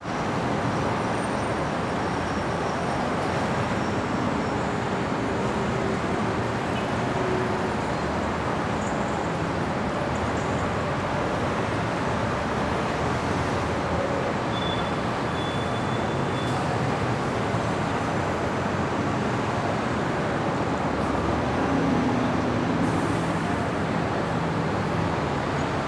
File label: City Ambience Rooftop